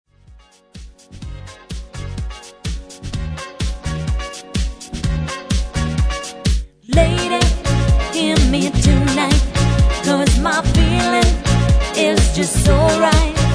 Party Band; Sydney

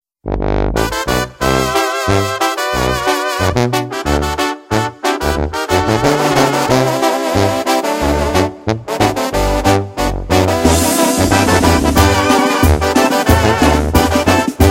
Banda; Chicago

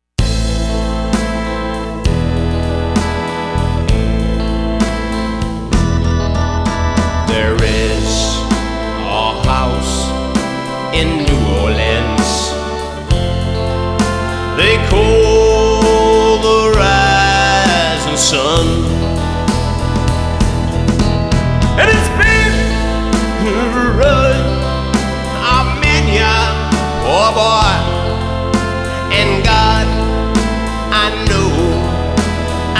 Classic Rock Band

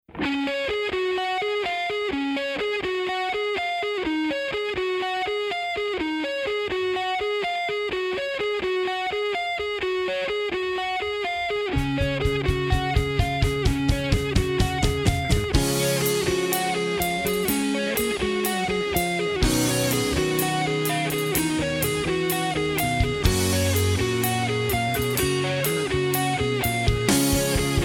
Cover Band; Indianapolis

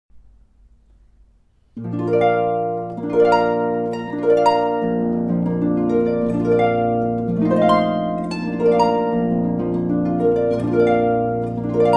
Harpist Irish Air; Houston

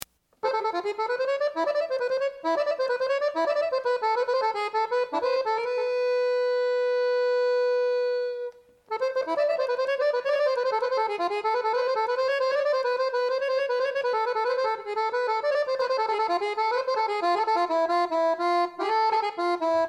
Brass Band; New York Brass Band

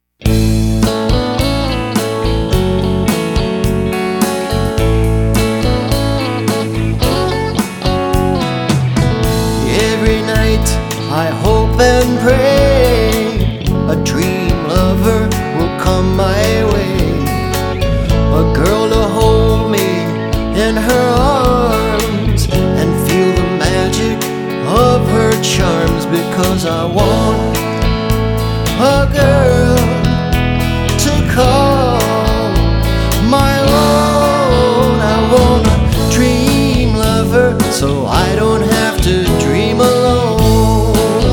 Classic Rock Band; Los Angeles Classic Rock Band

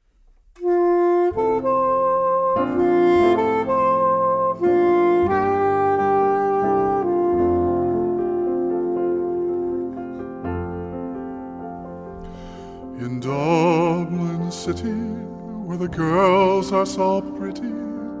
Irish Band; Chicago

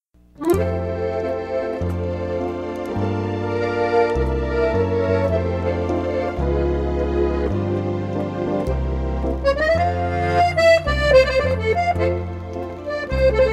French Band; Denver

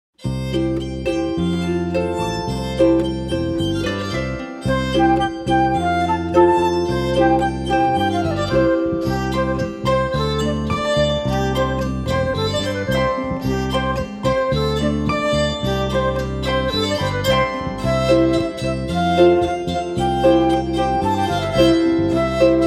Celtic Group, Psaltery Dance

